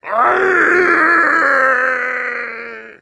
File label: scream pain; covy involuntary; elite